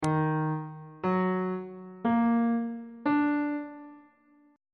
d sharp minor major 7th chord intervals on bass clef